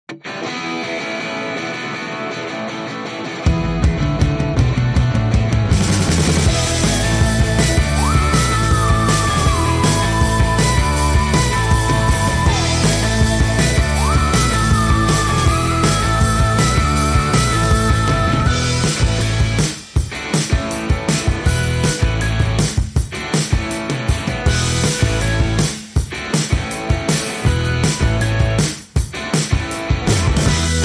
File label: No Lead Guitars